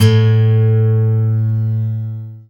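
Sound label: GUI Ac. A2, acoustic guitar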